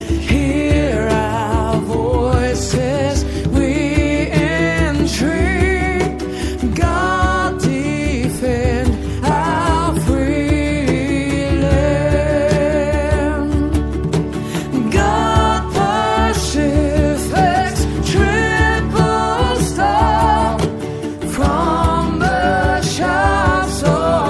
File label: national anthem, ringtone